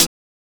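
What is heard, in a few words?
HH, hi-hat; X-Static Goldmine 1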